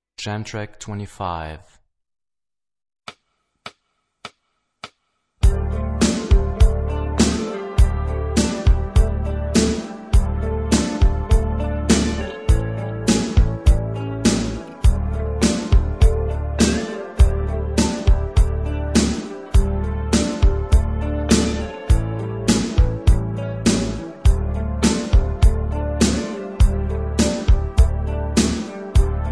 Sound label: Rock guitar; Rock gt Secrets